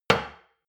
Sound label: shot glass place table hard, gastronomie; berufe; soundpool